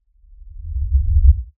LFE Reverse, Lfe Reverse Element Swell Low Bass; Swells; Blastwave

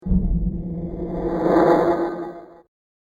Alien Lung Machine; Alien Sound Effects; science fiction sound effect; Andrew Fleming; Twistedtracks

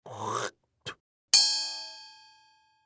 Cartoon Spittoon Throat Hock Spit

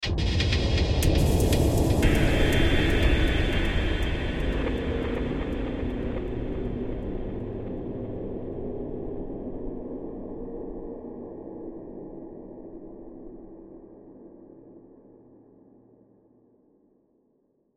Hit Delay Airy, Horror Hit Delay Airy Swell Eerie; Blastwave

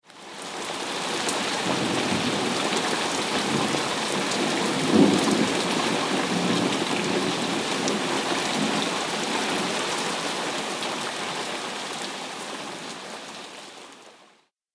Heavy Rain Thunder Ambience

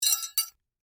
Sai Put Together, Handle, Metal; Warfare; Sword Sound Effects; Swords (Sound Effects:Combat); BlastWave

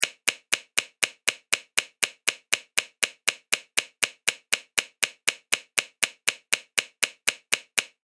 Timer Loop, alarm; electronic; Loopable

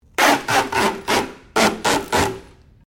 Sticky Tape Unroll; common tape; Offices; SoundEffects-Foundation4

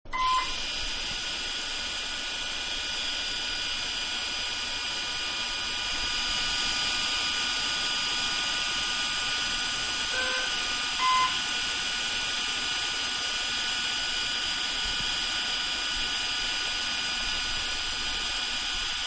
X Ray Machine, Dentist-X-Ray Machine; Machines; Manhattan Production Music

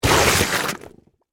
Impact Suction Mud, Texture, Large; Objects; BlastWave

